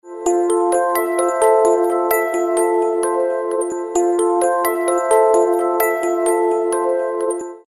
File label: trebleep ringtone; english ringtones